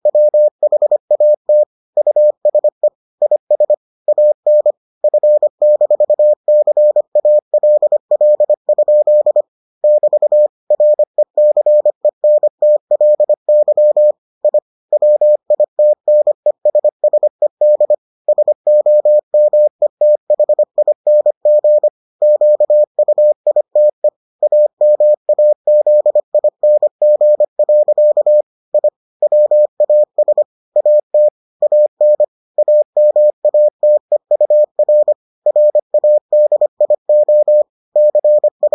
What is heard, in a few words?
morse